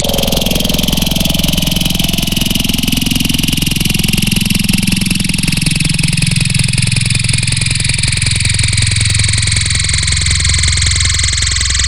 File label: Wet Bug, Wavetables; Cymatics; Essentials Wavetables; Serum